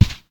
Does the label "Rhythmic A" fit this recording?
No